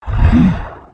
idle, creatures organic

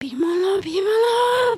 dice roll; emotes

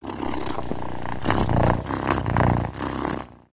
Kitten Clean; creatures organic

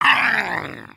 flail; creatures organic

